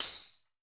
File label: ESSENTIAL PERCUSSION